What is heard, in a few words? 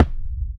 prominent kick drum c sharp key